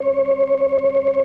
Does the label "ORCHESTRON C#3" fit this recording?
Yes